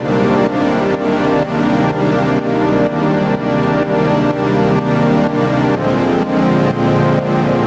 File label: Pad Lp 125 Cm